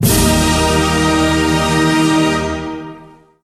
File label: anthem opening chord